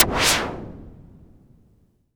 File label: noise fx; Masterbits; Switch FX